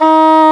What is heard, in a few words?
WND English Horn; Roland LCDP04 Orchestral Winds